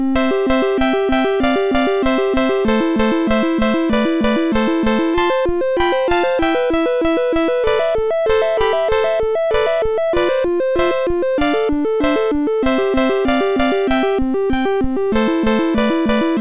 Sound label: sid MOS6581R3; Galactic Muncher